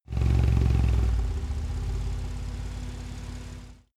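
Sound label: sports car exterior engine soft growl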